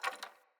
Hunter Bow Wood Rattle; Weapons; Player; Envoy; Bows

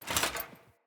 Foley Action Armour Jump; Player; Deora Armour

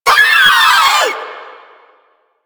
Volatile Runner Kamikaze Scream; Enemies; Abilities; Warframe; Proto Infested

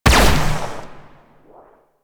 Amp Barrel Fire, Amplifiers; Warframe; Operators; Corp Amp Set One; Barrels

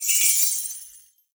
Naginata Whoosh Tonal, Weapons Melee; Warframe; Koumei Naginata

